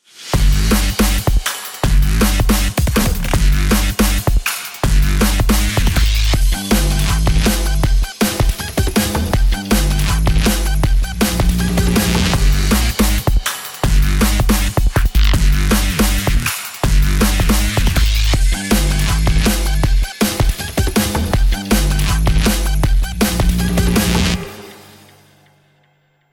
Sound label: start action, music